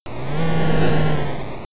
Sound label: death; Curse of the Azure Bonds